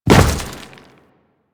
Step Dirt, Footsteps; Warframe; Grineer

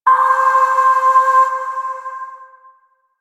Shawzin Oct Three C; Warframe; Zariman

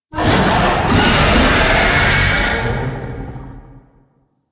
Tank Infested Vocal Attack; Enemies; Barks; Warframe; Lasrian

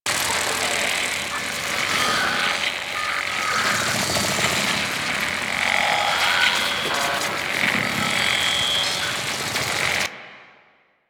Hack Minigame Pos Loop; Warframe; Ambience; Entrati

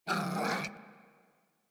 Puppy Idle Growl Sustained; Enemies; Vocals; Kubrow; Warframe

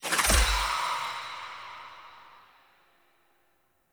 Armour Plating Deactivate; Powers; Bromius

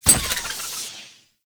Glyph Prism Hit; Warframe; Duviri; Glyph Puzzle

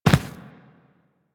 Inf Medium Land Default, Infested Footsteps; Warframe

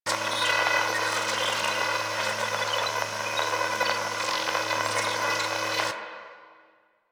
Camera Loop; Warframe; Veilbreaker; Quests; Kahl

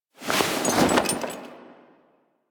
Camp Canopy Flap; Warframe; Quests; Veilbreaker; Kahl Camp

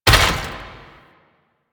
Necramech Ranged Vault Land; Enemies; Warframe

